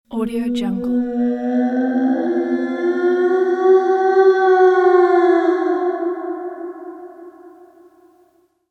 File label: scary ghost moan